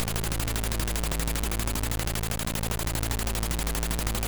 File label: Colony, MOS6581; MOS6581R3